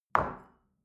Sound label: shell place on table medium; soundpool